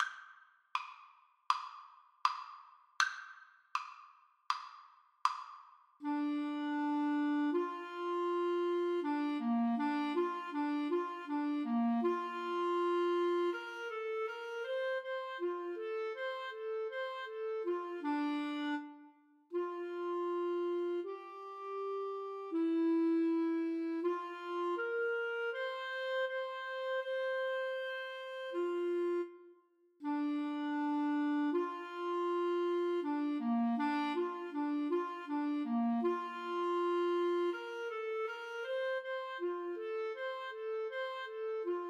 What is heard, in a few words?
Clarinet Duet